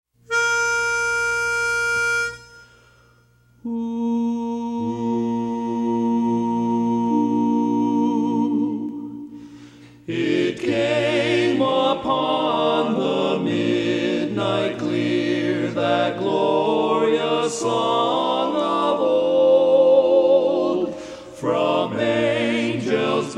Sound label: It Came Upon a Midnight Clear - Lead